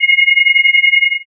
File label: forcefield